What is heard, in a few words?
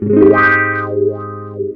GTR CHORD; Univers Sons; Jazzistic